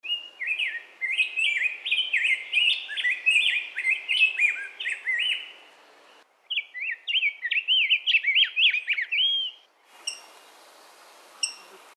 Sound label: rose breasted grosbeak; calls; Audubon